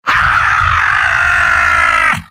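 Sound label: soldier m pain critical death; tf2; garrysmod; mvm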